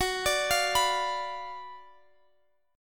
F sharp Major 7th Suspended 4th Sharp 5th, Guitar Half Step Down